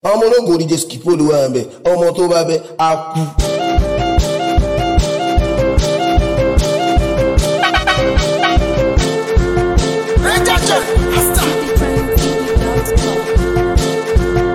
Mara Awake Beat

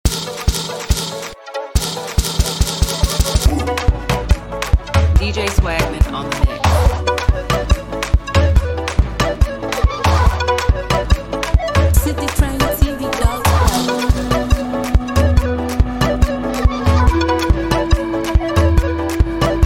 Ramadan Beat

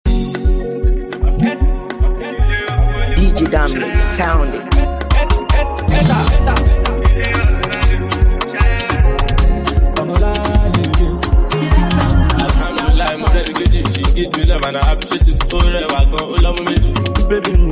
Pity Me Dance Beat

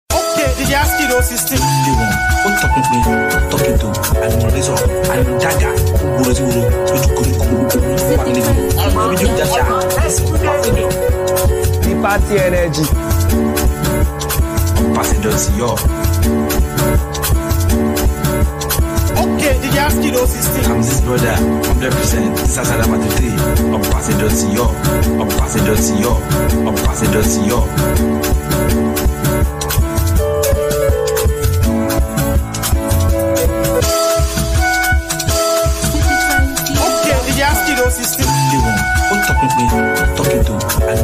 Beat

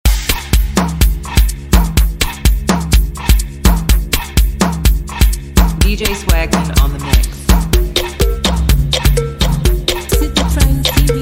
Mash Up Beat

